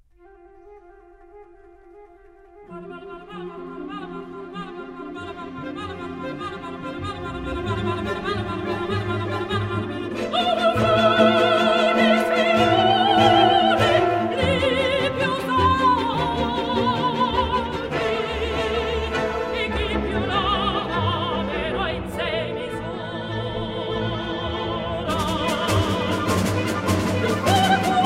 folk songs

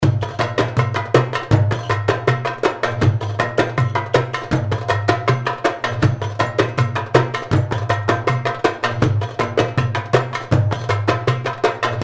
chiftelli; afrique; nordouest; arab

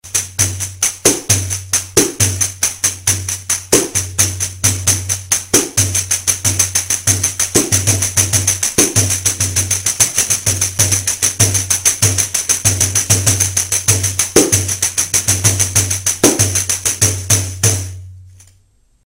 pandeiro; le bresil

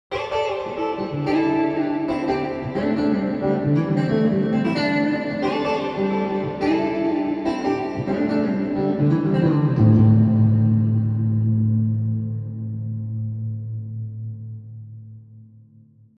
reverb; effets

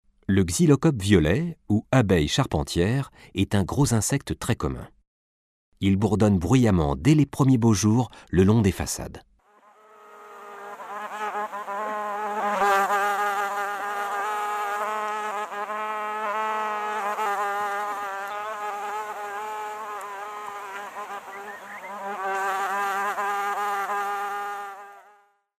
xylo; carnaval

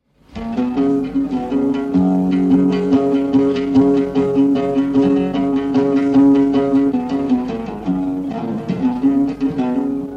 oud solo; afrique

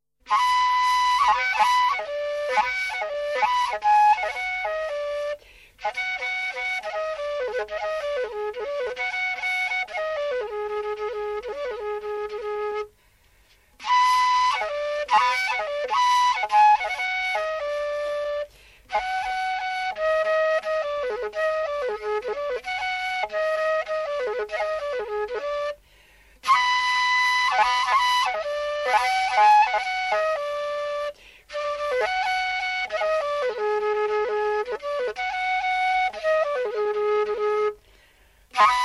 solo flute; afrique

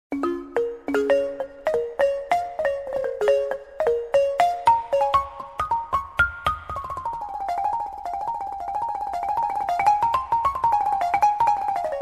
balafon kora; afrique; ouest

